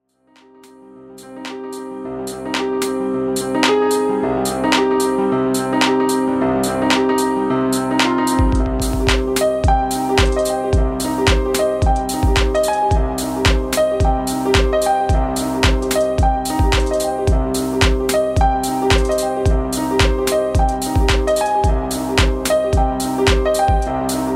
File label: ballad